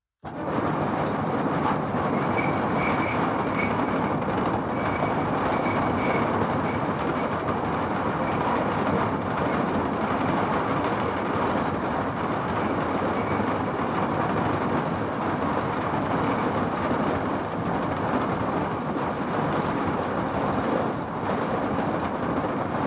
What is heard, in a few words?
Industrial Mechanical Grain Dryer Grain Coveyer Empty Start Run Stop; Hardware